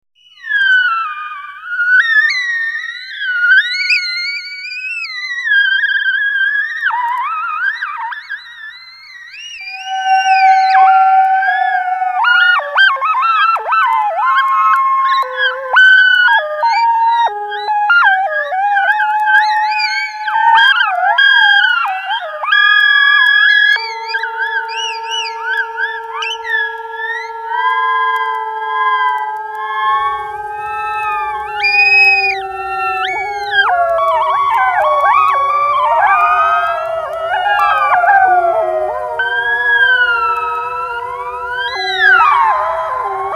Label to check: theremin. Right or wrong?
Right